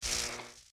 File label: spark; aquariumboss